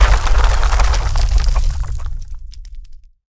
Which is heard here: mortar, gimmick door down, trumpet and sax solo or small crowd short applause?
gimmick door down